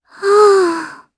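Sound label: Vox Sigh Japanese; Kirze; kings raid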